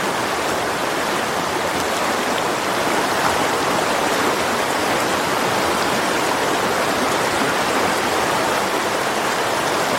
River in mountains, in nature

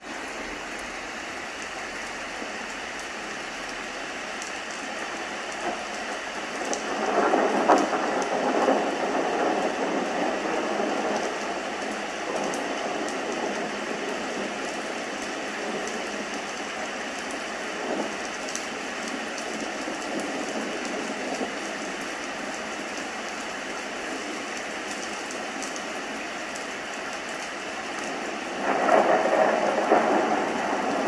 Storm

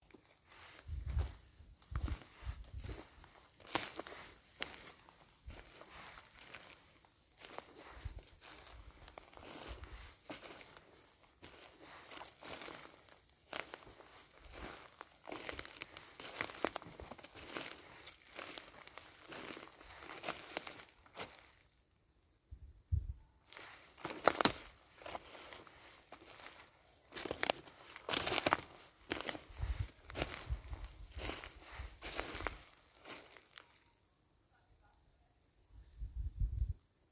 Walking in the forest

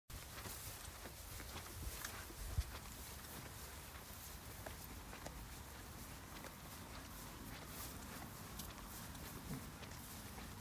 Sheep eating grass

